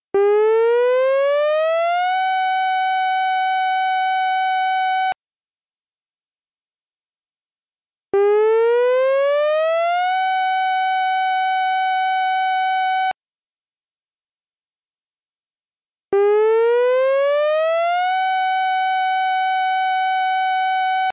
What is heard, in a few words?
fire muster